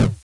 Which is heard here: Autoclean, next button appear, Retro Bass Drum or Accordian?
Retro Bass Drum